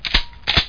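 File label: shotgun cock; duke3d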